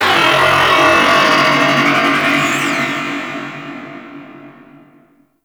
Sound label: Outpost Creature Robotic; Corpus Outpost; Warframe